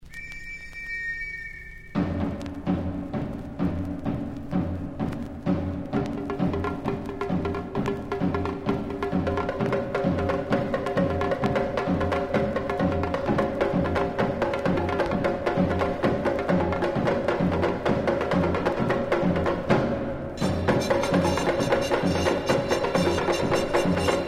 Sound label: batucada